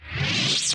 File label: saber off; lightsaber